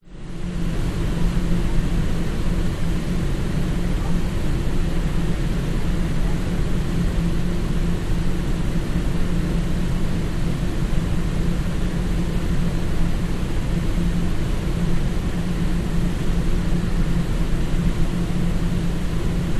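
public places city large extractor for underground carpark street brisbane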